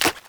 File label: Agaric Mushroom Grow